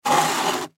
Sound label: Chair Move Back Legs Scrape; Chairs; Offices; Foley; Blastwave